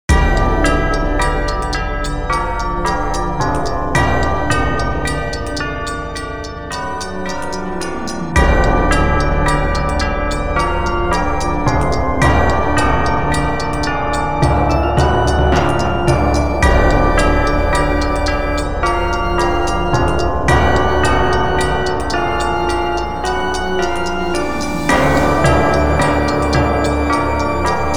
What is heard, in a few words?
Church